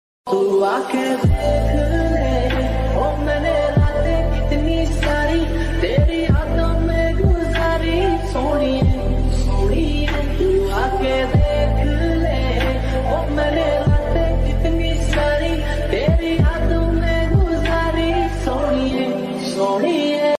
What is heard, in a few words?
lofi songs ringtone